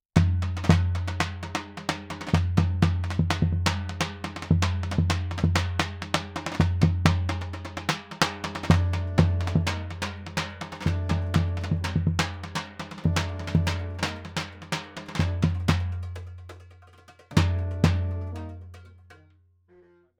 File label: bass drum hi